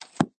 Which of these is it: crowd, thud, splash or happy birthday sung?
thud